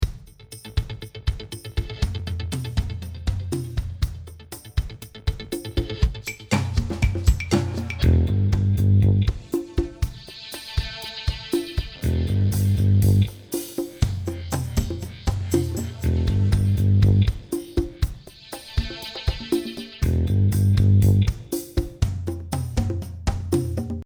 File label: bass